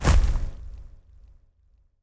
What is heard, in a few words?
Level Editor Remove